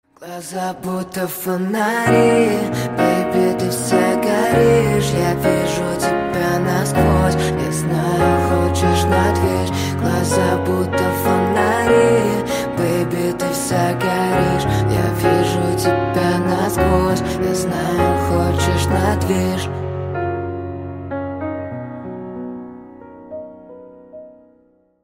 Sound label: Acoustic Version